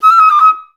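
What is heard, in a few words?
flutes D#5; Best Service; Peter Siedlaczek's Advanced Orchestra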